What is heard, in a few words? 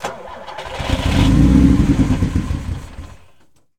Ferrari Dino Start Stall; Automobiles